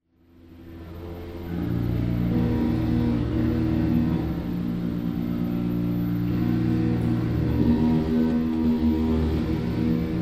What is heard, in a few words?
uber organ